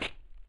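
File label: shuffle, footsteps hard surface jump up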